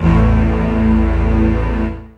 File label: string; Techno Trance Essentials